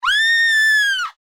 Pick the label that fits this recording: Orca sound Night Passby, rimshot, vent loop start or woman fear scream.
woman fear scream